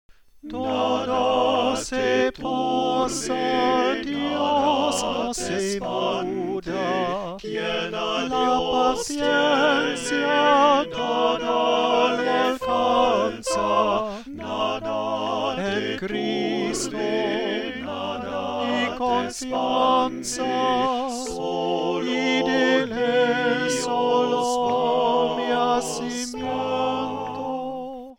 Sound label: tutti